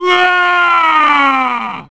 Scream; Wario

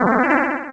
Power Up Smoke; NSMB2

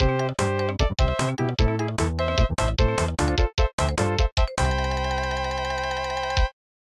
Fanfare; MSP

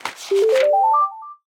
Gift Box Open; SM3DL; Kino

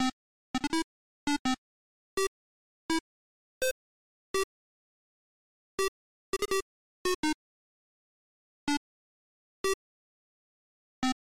Explosion; Mario Paint

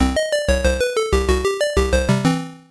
Death Jingle; DK3DG

